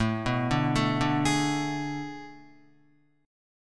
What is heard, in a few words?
A Major 7th Suspended 2nd Suspended 4th, Guitar; arpeggiated